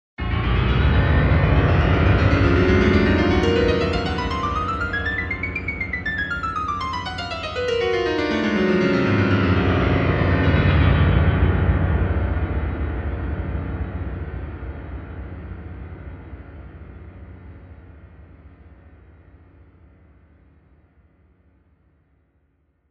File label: scale